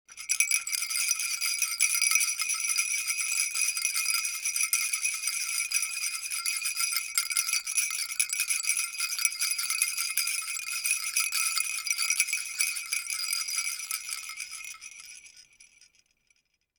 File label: toy rattle